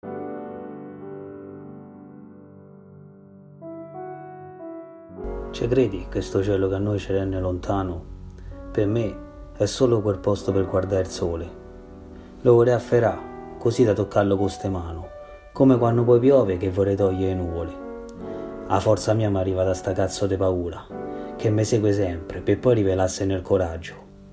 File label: piano base; ITAJANO